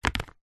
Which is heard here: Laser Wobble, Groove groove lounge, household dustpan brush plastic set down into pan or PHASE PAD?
household dustpan brush plastic set down into pan